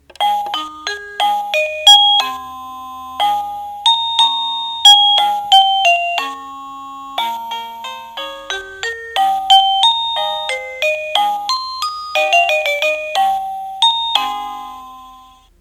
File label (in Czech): melodie